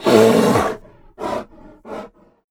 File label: Ironfur Grizzly Death